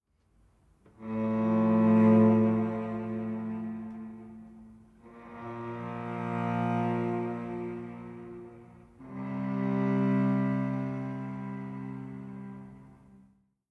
Savannah Music; kenshi windows gamerip 2018

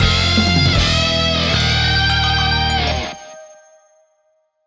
WHITE JINGLE LOSE; The Magic Nightmare; Android

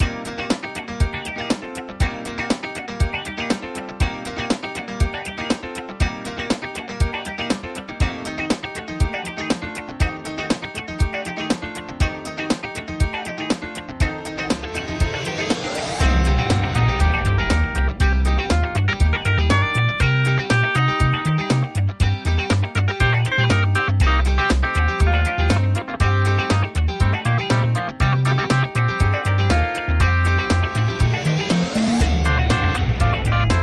bgm brick Battle; kardia fantasy mamono hime tachi to bouken monogatari; android

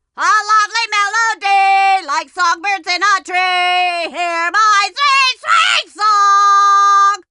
Parl Music; Disney's Cinderella's Dollhouse

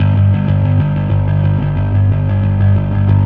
Fuzz G3; Torchlight III; PS4

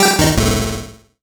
MUSIC EFFECT Solo Chip C64 Negative; startide macos switch windows gamerip 2017

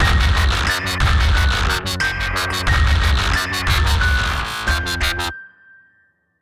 Basic Mood Alteration

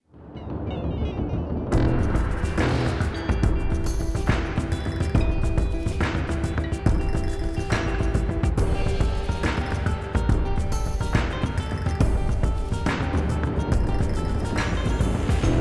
Mystic Forest Action 140

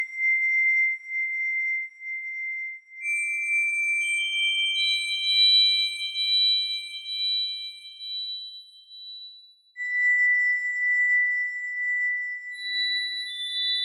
Pluck; Koloro; PS4

